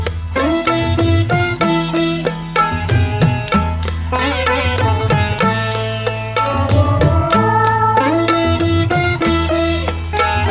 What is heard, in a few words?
tamil music ringtone